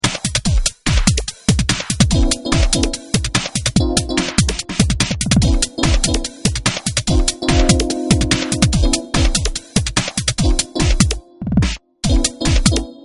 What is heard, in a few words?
hard electro